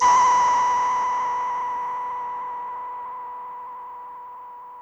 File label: SYNTH; Sentinel